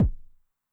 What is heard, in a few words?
BD MOD [Pitch+Depth], 909mod, BASS DRUM